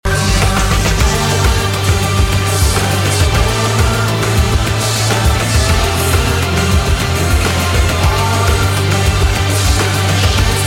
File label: silent melody; infamous